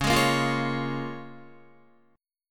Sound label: C sharp 6th C sharp Guitar; strummed; 9,11,8,10,8,8